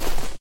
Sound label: snow